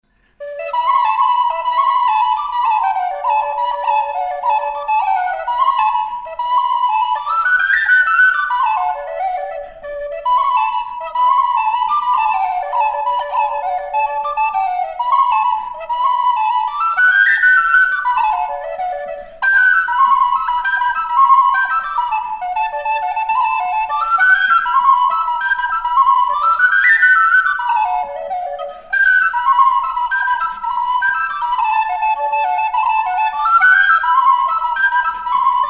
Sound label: Cooley's Reel